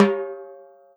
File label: timbal hi; E-MU Producer Series Vol. 7 – Old World Instruments